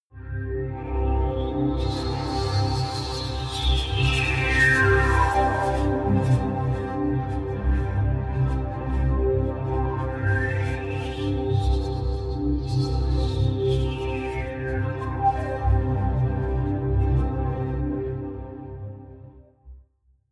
pad sweep hiss; flaechen

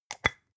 spice jar scratch tonal close fast

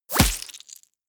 bullet small impact body

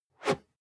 whoosh whistle fast; swooshes-swishes

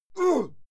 male fight yell short

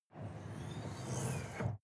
sports car ext bonnet hydraulic

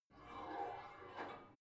barbell heavy plate pull out slow, fitnessstudio; sport freizeit

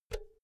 power cord pull out quick; objekte; elektronik

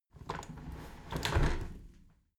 window handle squeak turn close soft; objekte; fenster

